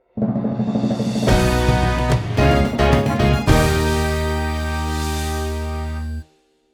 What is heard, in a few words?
Victory Fanfare; Phoenotopia Awakening